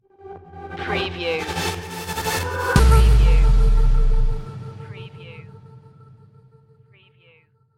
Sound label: digital siren stinger